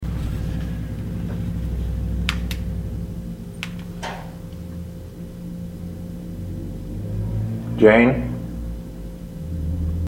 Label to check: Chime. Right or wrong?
Wrong